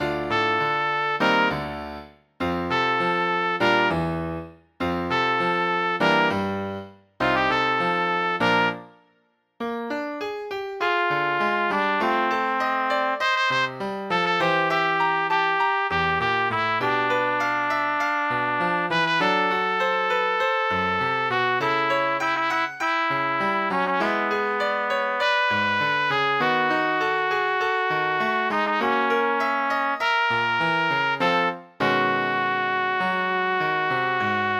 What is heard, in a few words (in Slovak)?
pre trúbku a klavír